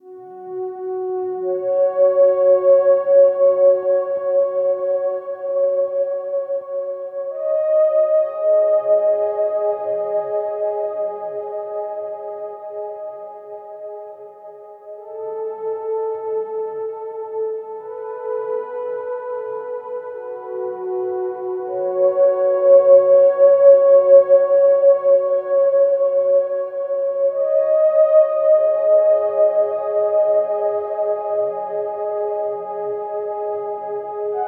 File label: bg music loop; lowglow